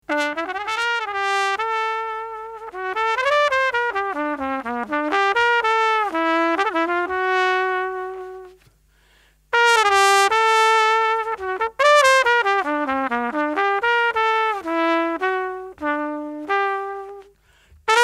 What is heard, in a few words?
trumpet intro